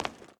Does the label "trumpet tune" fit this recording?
No